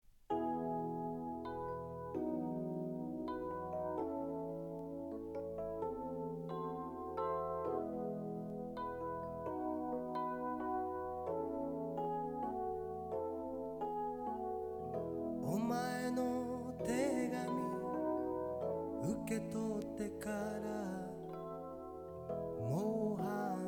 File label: PIANO